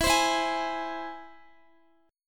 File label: E Augmented E, Guitar; strummed; x,x,14,17,16,15